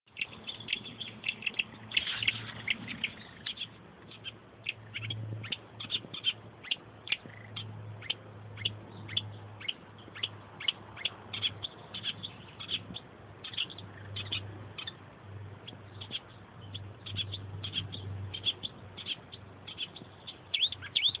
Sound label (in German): unbekannte vogelstimme